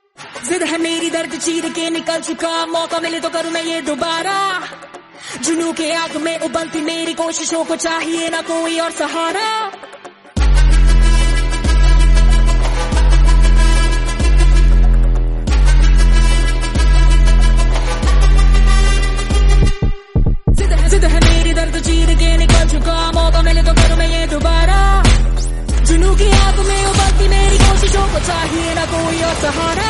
Remix Ringtone; URI

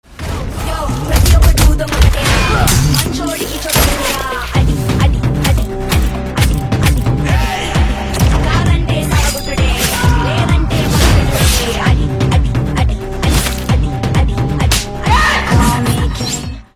Teaser BGM; SlumDog 33 Temple Road

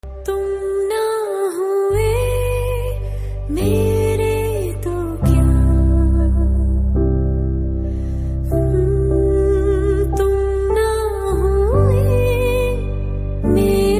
Ringtone Female

